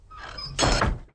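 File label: chest close; The Elder Scrolls III - Morrowind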